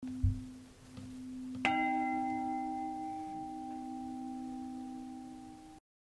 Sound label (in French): cloche; St Papoul